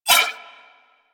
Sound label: Nunchaku Prime Whoosh, Weapons; Warframe; Tenno